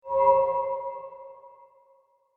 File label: Guitar Chord Musical Transition Reveal Chord Swell; Sound Design; Guitars (Sound Effects:Musical)